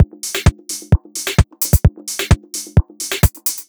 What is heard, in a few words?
130BPM Drums perc, techno drum; drum fx; Beats